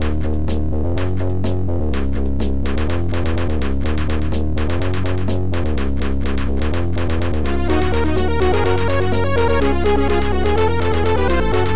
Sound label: BASS!